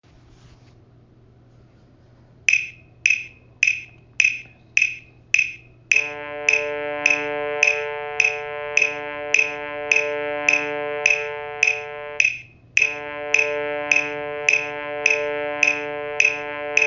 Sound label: low brass basses